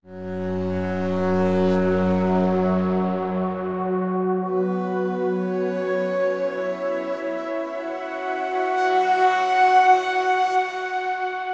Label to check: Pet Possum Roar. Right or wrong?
Wrong